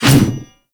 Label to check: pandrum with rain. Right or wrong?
Wrong